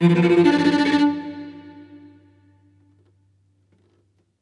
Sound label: Music Level Fight Stinger; Krish